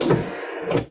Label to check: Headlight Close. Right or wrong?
Right